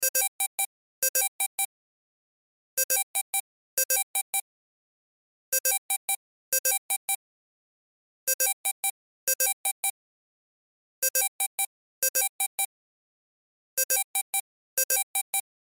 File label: Cell Ringtone; Loop; Cell Phones; Electronics